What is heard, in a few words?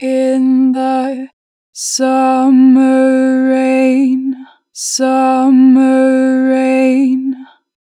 Natural Vibrato, Vocals, In the summer rain, 4 bar vib; Harmor Vocals